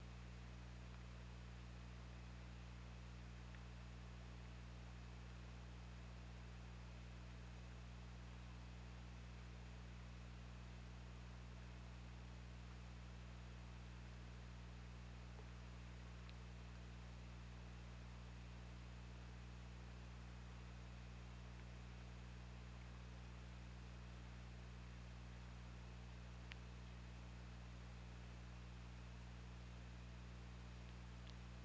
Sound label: Orca; OrcaLab